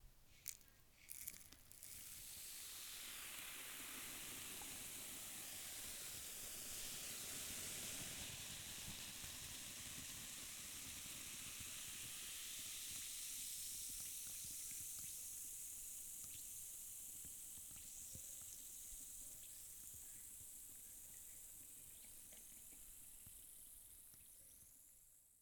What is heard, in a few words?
Tablet Fizzing In Water